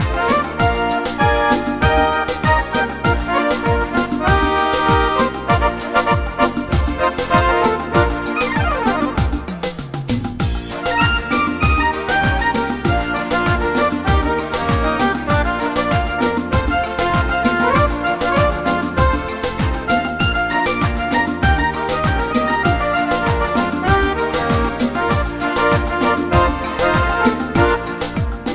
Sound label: Bomba Dance